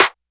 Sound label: Clap 808; INFINITEARTH; 808Flex